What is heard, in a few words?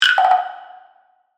Hit Percussive, Reverb